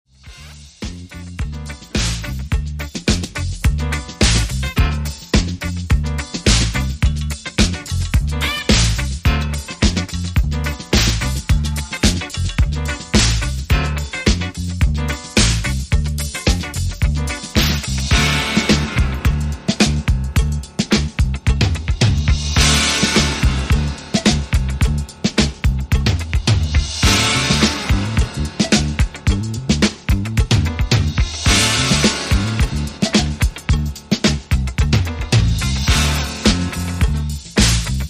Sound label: Instrumental